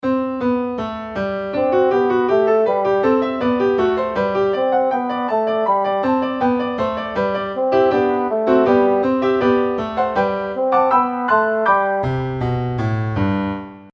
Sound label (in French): ostinato, basson